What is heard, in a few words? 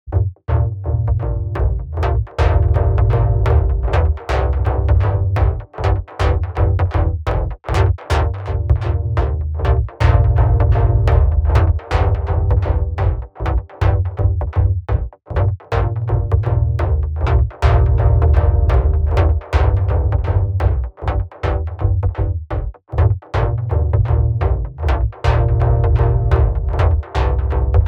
synth; delamar mystery synth